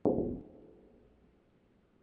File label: Footstep Glass